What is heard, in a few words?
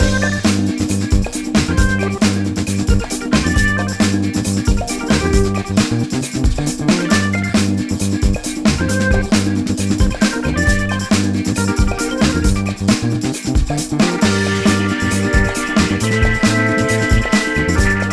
Boss Stage Loop